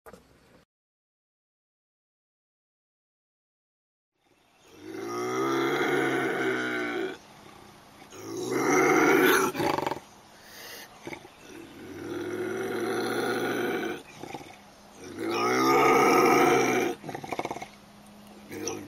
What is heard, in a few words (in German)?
luchs; zootiere erkennen